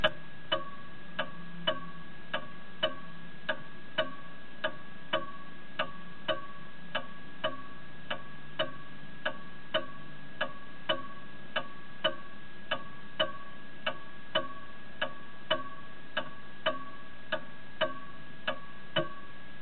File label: ticktock halfpast